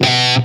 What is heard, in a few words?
METAL SINGL; East West; Global Sounds